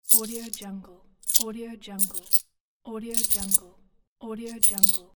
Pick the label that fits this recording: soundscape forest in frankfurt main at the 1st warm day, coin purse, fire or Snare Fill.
coin purse